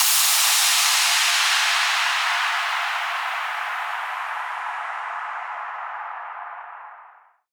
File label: Exhaust